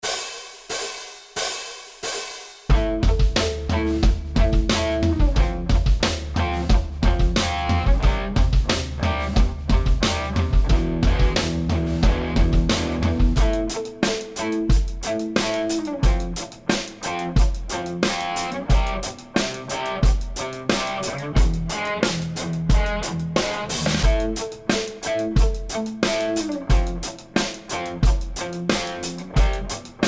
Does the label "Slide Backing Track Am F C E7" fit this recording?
Yes